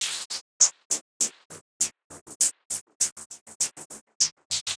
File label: Side chain gated Topline (100BPM); musicradar